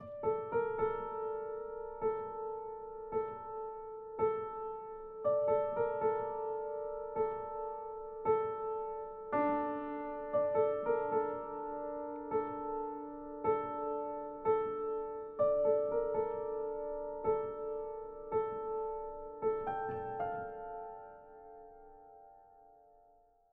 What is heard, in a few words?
piano theme